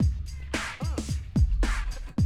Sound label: drum loop, 100 BPM